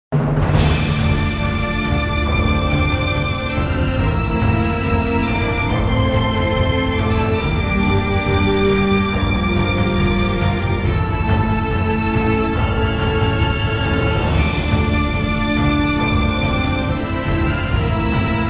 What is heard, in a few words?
End Game Defeat; Armello